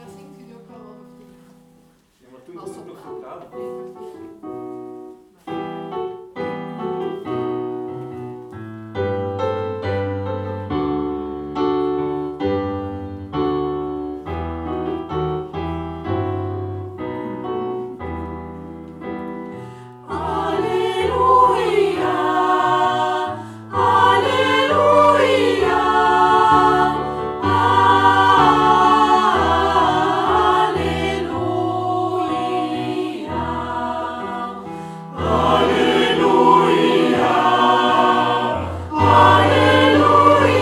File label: Taize